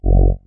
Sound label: Bass Hit